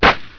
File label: CRACKER, 爆炸; 爆炸系列声音素材